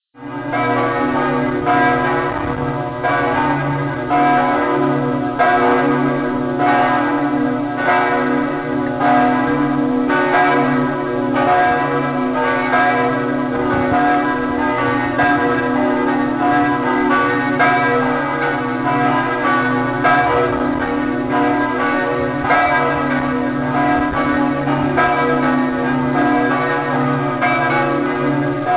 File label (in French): cloches